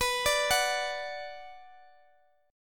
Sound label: B Minor B Guitar Open G